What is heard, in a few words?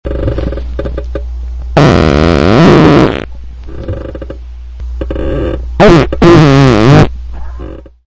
fart